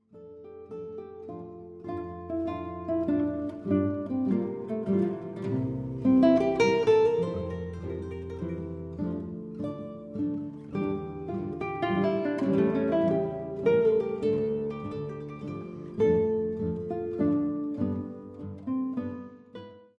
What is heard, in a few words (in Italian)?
Largo e Spiccato; vivaldi; concerto 11